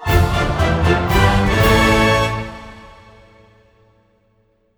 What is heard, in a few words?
Fanfares Victory; BulletHellTemplate